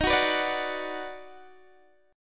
Eb Minor Major 7th Sharp 5th Eb down Guitar DADGAD, chord